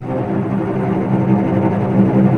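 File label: tremolo, strings; East West - The Ultimate String Collection; VCS TREMOLO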